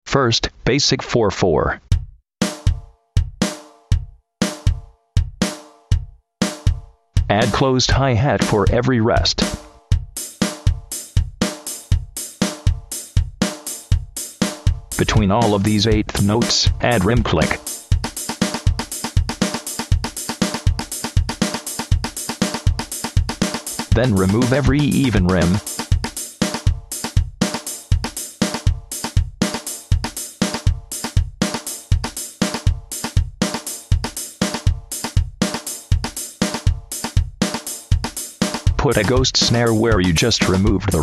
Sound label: keydrums; KEY DRUMS DEXTERITY EXPERIMENT